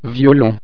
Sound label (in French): violon